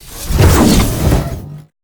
robotic lifeforms whooshes titan angry move sweetner; robotic lifeforms 2; soundmorph